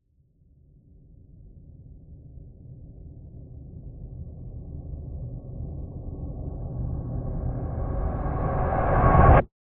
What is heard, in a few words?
sound design cinematic reverse impact crescendo tension build